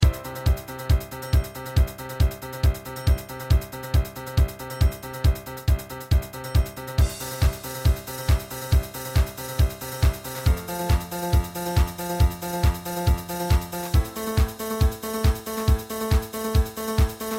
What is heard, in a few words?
Ring Tones